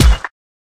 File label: Prize Egg Tap Stone; Sfx - Prize Egg Tap Stone 01